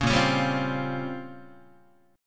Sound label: Bb Minor 6th Add 9th Bb down Guitar; strummed; JGuitar